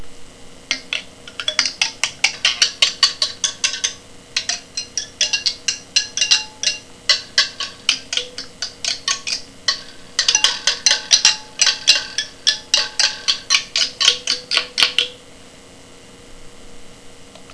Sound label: coat hanger rhumba